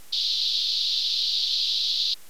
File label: fc=4kHz; 音の高さ; 音色的高さ